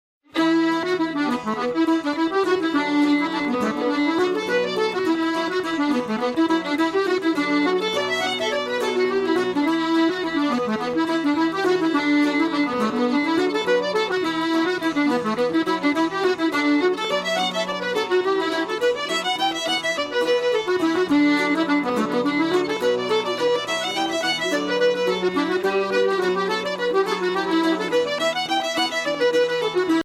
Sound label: Reels